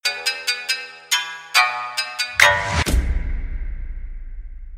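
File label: outro music; ff2; lof shin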